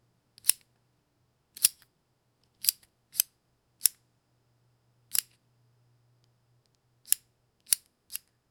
shield activation sound

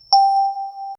soft notification sound, calming

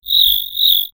ant insect

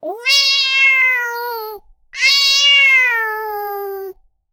kitten cat crying like re

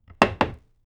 placing a wooden wall, wall

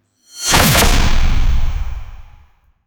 giant pen weapon swing

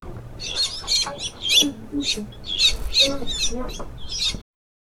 parrots that are talkin; vocalization

